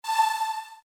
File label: synth violin short